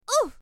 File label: Bumping